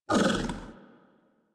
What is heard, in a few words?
Tame Interact Stand Scratch Chatter Bark; Enemies; Warframe